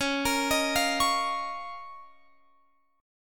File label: Db 6th Add 9th Db down Guitar Open F; Db6add9; arpeggiated